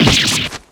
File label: damage